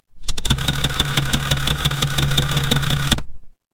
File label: mechanical engine